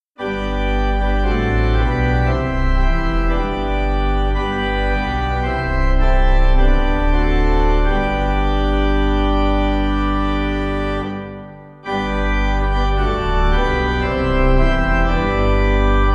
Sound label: Pipe; ChristWhoseGlory; SpanishHymn